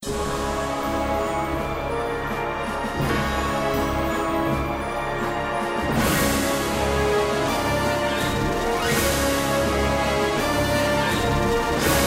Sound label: Battle Boss Music; Zak Storm Super Pirate; mobile